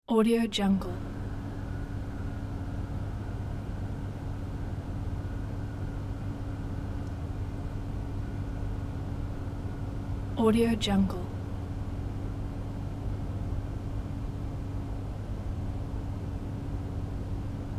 night street conditioners

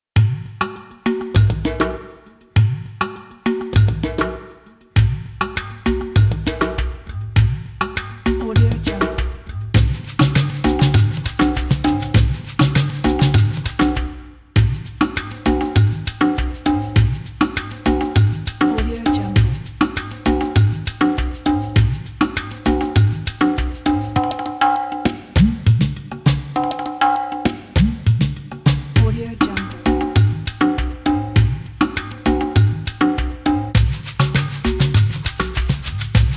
arab percussions

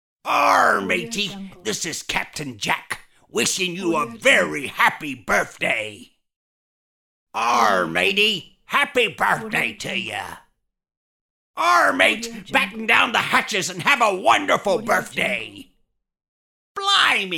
pirate happy birthday greetings

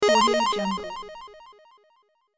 retro 8bit video game start sound

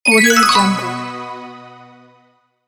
fairy tales magic spell

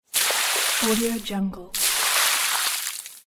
water poured out of bucket; vintervarg

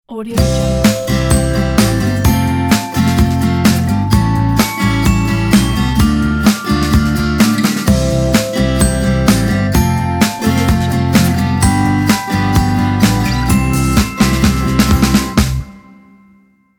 acoustic positive ident

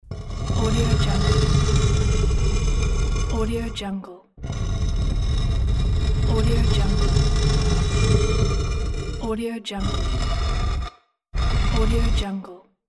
stone scrape

